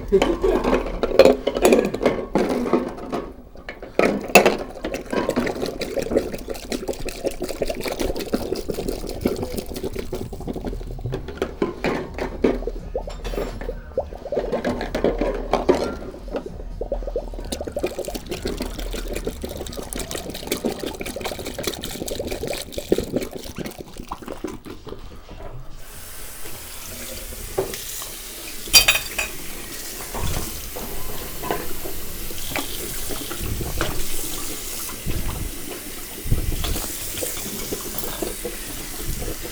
Cleaning bottles in bucket

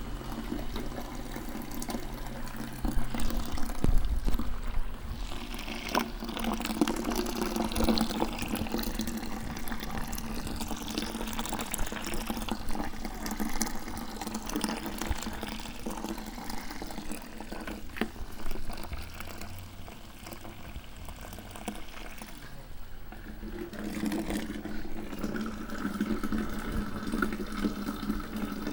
Long Siphon

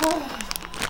Ahh zip crinkle

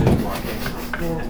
Sniffles

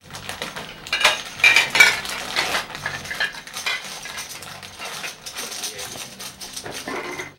bottles and caps

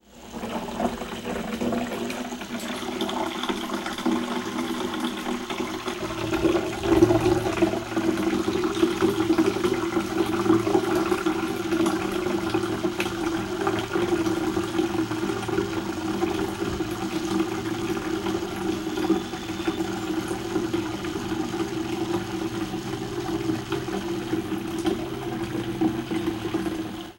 bucket fill, fieldrec

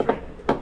3 bumps

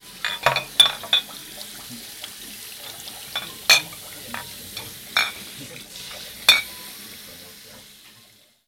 bottles rinse

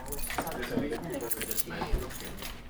Jingling

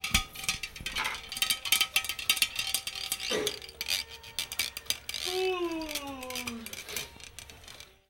carboy clean

